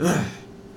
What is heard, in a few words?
goblin hurt; mob